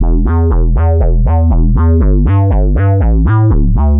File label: Tech Bass 120 E, techno; musicradar; 120bpm; Bassline